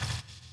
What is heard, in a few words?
Flyer Vanish